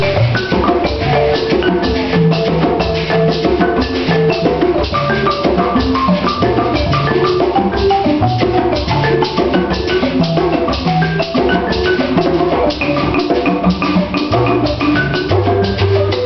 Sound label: Mbira marimba